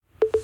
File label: notify